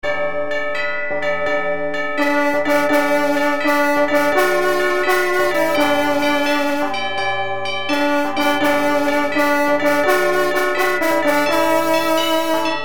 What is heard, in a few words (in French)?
chant canal droit